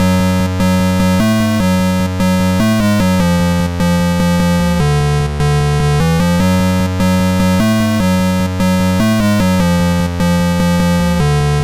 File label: 8-Bit Cover